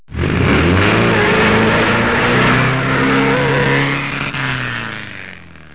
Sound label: egg clock